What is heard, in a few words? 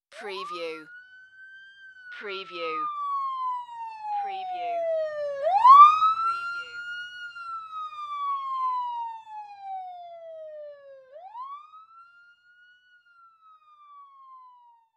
Fire Truck Siren Pass; Vehicle / Transport Sounds / Emergency Vehicles